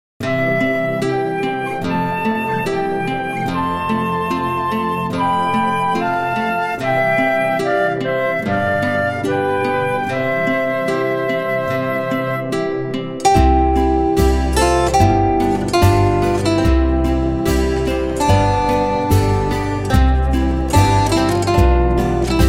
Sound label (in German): weihnachten, zither; weihnachten mit der zither